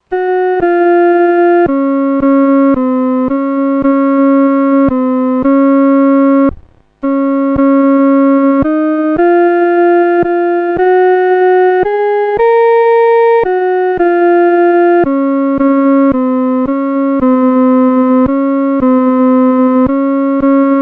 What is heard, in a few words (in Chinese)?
独奏 第二声; Sweet Hour of Prayer